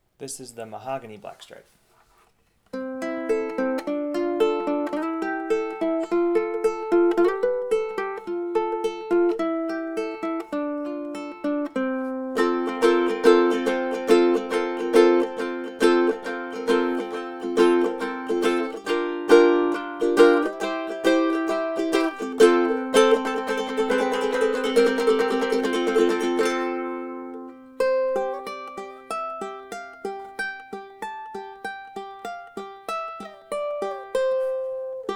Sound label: black Stripe Mahogany, ukuleles